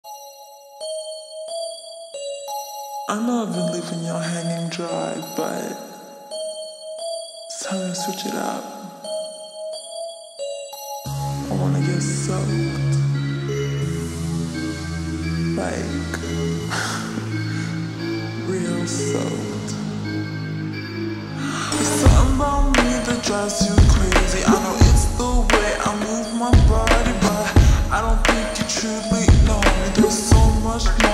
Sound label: slowed reverb